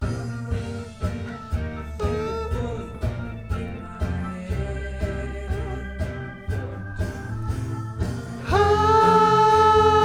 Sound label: VOX Back